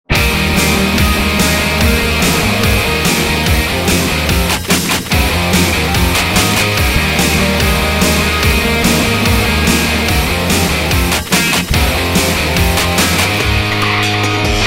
Video Rock; Soundzabound Volume 5

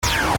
Burst

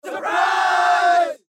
Surprise Crowd

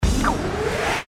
Burst Crescendo

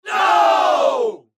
crowd no

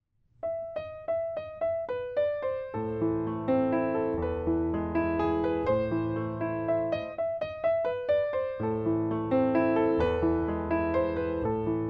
Fur Elise Beethoven; Soundzabound Volume 2

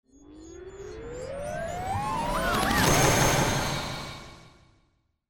Burst Crescendo

